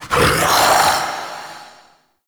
Male Vocal Charge Scream; Characters; Enemy; Vocals; Mockeries

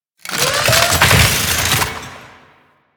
Necramech Ranged Get Up; Enemies; Warframe

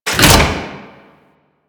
Infested Metal Clunk; Ambience; Props; Warframe; Corpus Infested

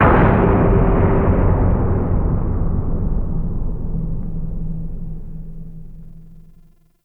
Distant Metal Bang; Ambience; Warframe; Corpus Railjack

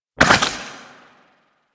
Goo Gun Tail

